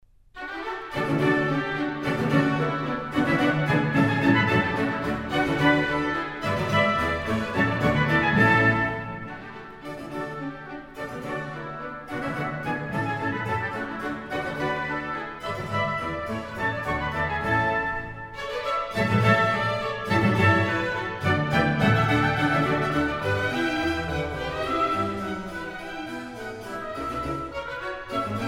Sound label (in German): orchestersuite nr 3 d dur bwv 1068 bourre